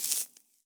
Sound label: Soft Dry Rustle